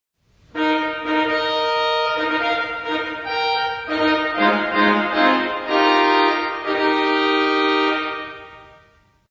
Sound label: French Trumpet; Longwoodgardens